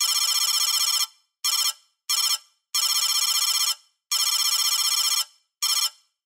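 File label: electronic sounds of data transmission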